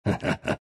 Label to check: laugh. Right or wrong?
Right